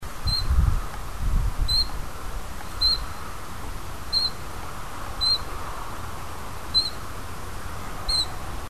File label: call netherlands